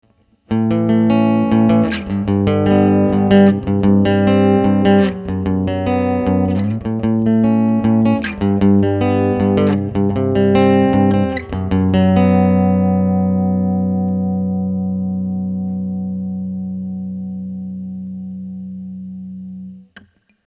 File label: Amp R-121 Front